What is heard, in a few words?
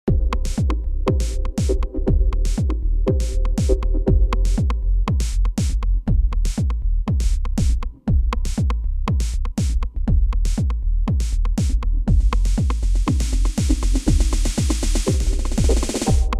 Organ Filter Su Bass TR Drum no FX